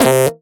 Error High Short

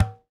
Tennis Racket Ball Hit Light

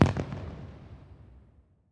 Fireworks

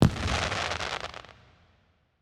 Celebration Fireworks

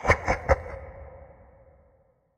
Laugh; Spinal Serve; Wieldables

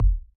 Ball Collision Geo Tackleball Extra Light

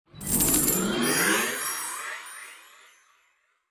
Purchase Made; UI; IAP

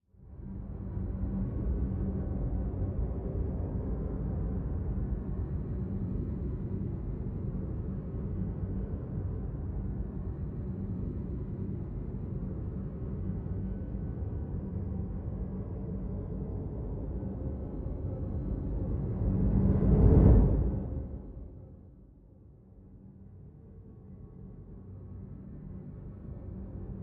Skybox Warp Ambience Baked

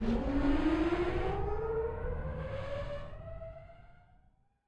Player Conveyer Start

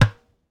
Tennis Racket Ball Hit Med

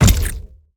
Pulse Wrench Hit Ground Other

